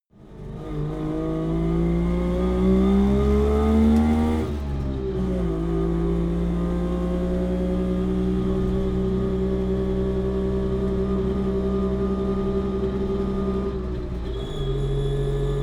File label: car saab 92 accelerate soft decelerate interior